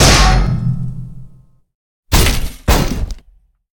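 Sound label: phantom knight death, monster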